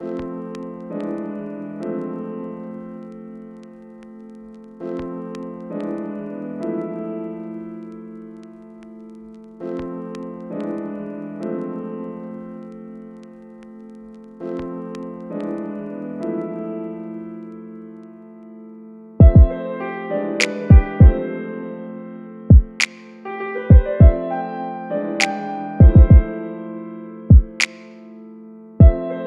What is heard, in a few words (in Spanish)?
muestra piano